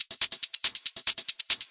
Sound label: 140 techno hat maker, drum loops and breaks